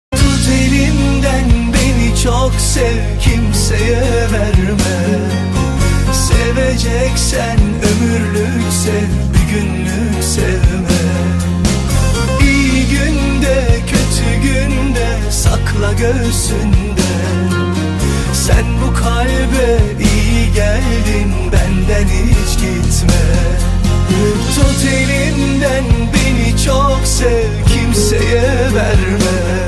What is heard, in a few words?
Rington Turkey; Sad